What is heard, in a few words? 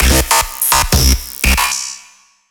gate, bass